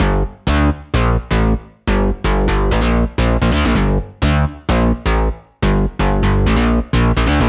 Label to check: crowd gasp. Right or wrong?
Wrong